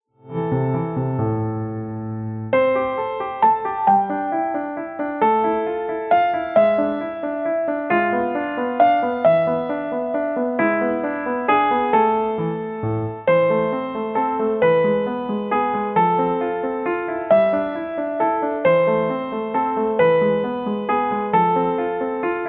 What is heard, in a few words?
piano waltz 6 8